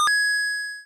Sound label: achievement; browserquest